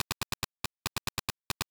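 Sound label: HIHAT ELECTRO 140, Techno Drum; Techno2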